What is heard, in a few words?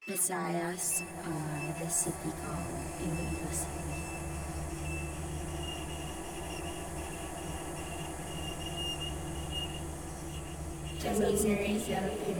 electric live